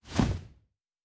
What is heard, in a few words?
Wing Flap, Monsters; Fantasy Sounds Bundle